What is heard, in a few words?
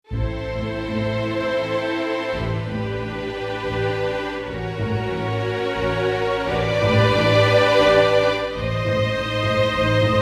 Adagio Trem Split, Orchestral; PC3